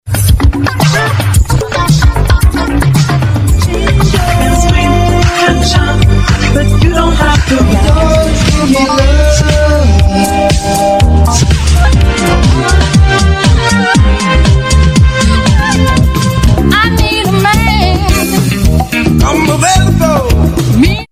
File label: garage